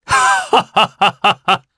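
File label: Laugh, Japanese; Roman; kings raid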